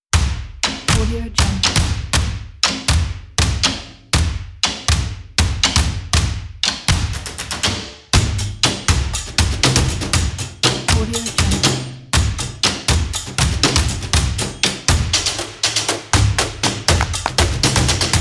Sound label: drums